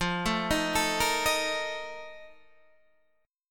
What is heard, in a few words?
F 11th, Guitar, DADGAD